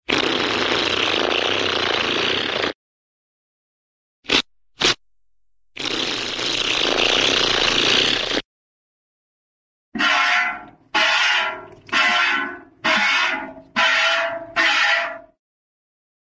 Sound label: squirt, cartoon razzberry and whip cream squirt